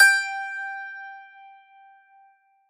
g4 Pick, strings

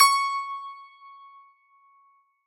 cs5 Pick, strings

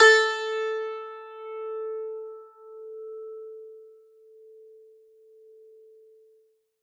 a3 Pick, strings

